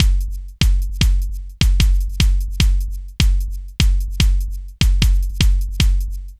Kick Pattern Low; Sonic Foundry (Sony Creative Software); Electronic Point Blank

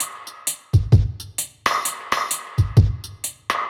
Dub Drum Kit C Wet 130bpm; musicradar; Dub Drums

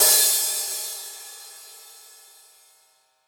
Acousti Hat Hit, hihat; Ultimate hihat samples

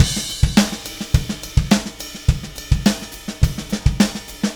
Beat Crash; Session 02 - Clinical

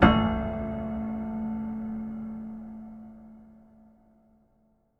PIANO A3; Best Service; Advanced Media Trax Modern Composer; PNO HAMON

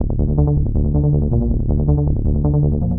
Bubble Bass; Sonic Foundry (Sony Creative Software); Knutrix Drum & Bass

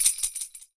YAQUI; AKAI S6000 CD-ROM - WorldSounds

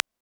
Click, drum kit; Polyend; Stazma & Concrete Collage

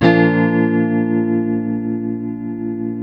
TELE CHORDS MAJ B, Guitars; Spectrasonics; Hans Zimmer Guitars VOL-1

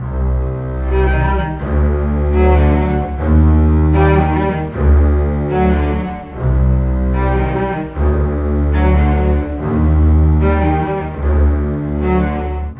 Cinematic Bass & Cello, Loop Samples, Orchestral; Sonic Foundry (Sony Creative Software) - Crimson Blue and Fabulous Horncraft 4 RnB

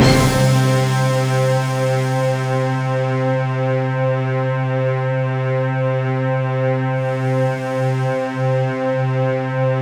C3, synth; 90 s Sample CDs; NiceBeats; SynthLine VOL-27

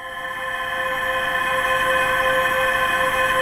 THROATY; Pro-Rec - Synthsonic; JV FILM

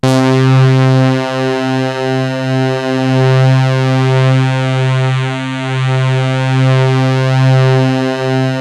SYNTH LE; MORPHEUS; Syntec; Wall of Sounds

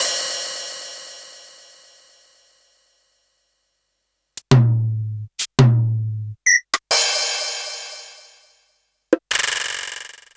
Roland E10

